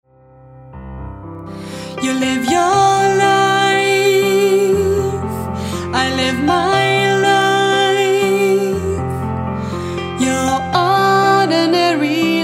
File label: Ordinary Life Piano